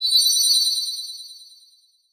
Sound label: Transition Musical Tone Warble; Production Element